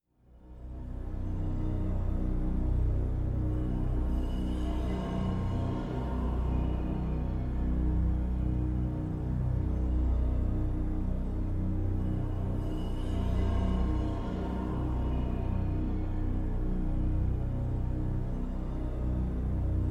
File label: pads only